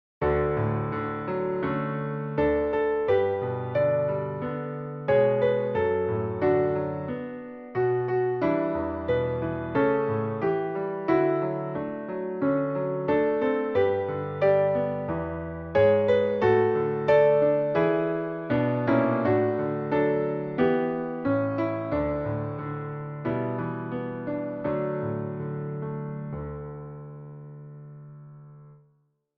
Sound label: piano Ending